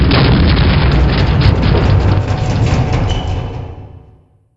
mortar metal creak

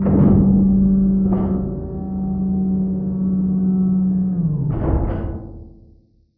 machine distant

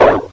pit drone pain; scmod; alienassassin

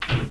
switch off; buttons; m2 pfhor

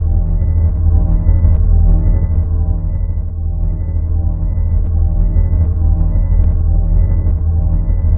tension, ambience music

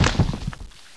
tyrant knees

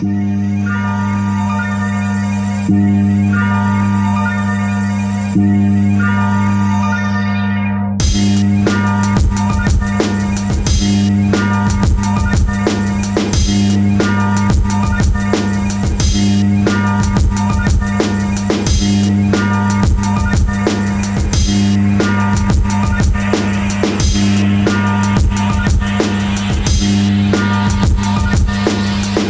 music

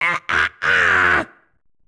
kid pain; buu

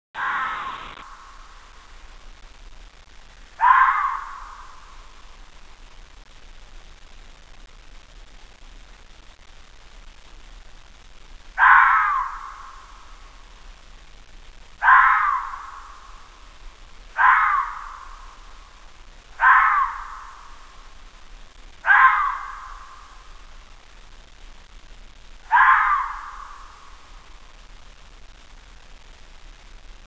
fox cry

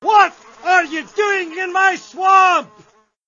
swamp

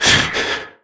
fall pain, player

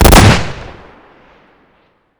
shotgun dbl fire; hl2

